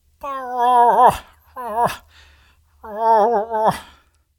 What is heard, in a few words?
Moose Approch